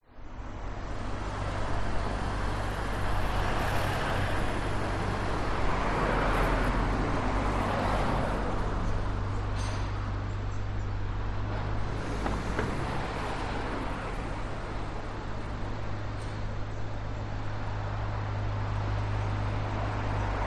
traffic town pass by outside mechanics work behind birds, vehicles